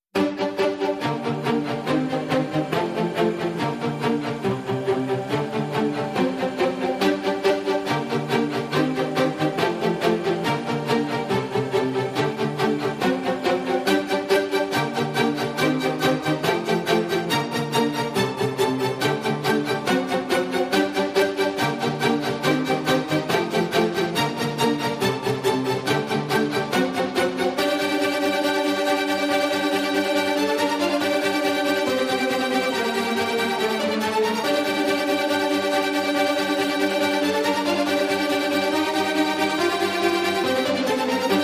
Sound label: Strings Mix